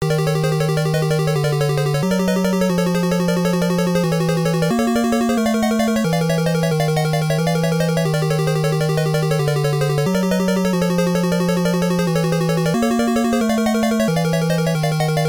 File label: Castle Theme; SMBDX